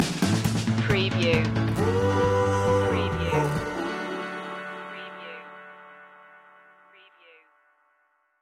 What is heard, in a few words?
rainbow pop stinger